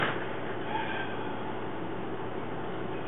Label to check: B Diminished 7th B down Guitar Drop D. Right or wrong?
Wrong